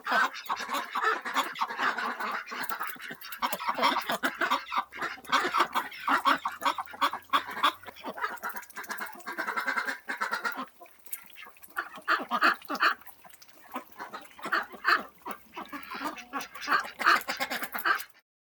spot billed duck herd, animal

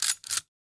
shotgun pumpaction

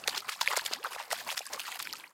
fish rampage water

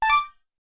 cursor, button

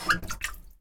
water stop

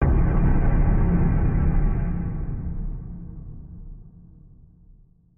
drop

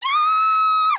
girl kyaaa

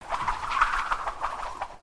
whip gesture

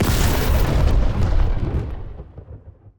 magic electron

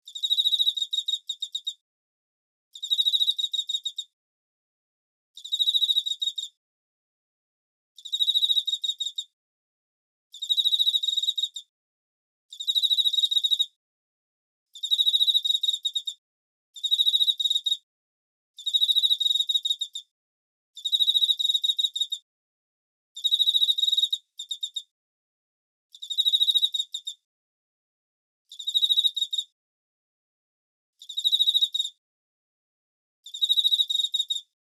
teleogryllus emma cry

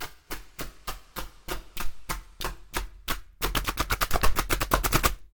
hitahita

